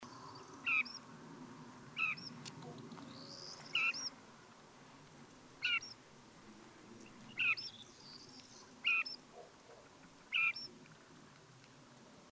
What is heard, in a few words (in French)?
pie grieche meridionale